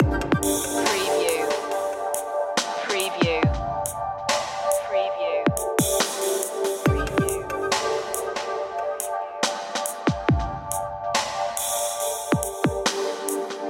tranquilizer loop

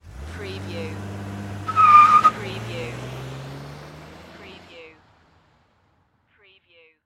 Auto Drive Away Tires; sound effects bible

